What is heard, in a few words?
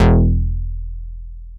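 E1 ANA BS; Synclavier Sampler Library; KEYS AND GUITARS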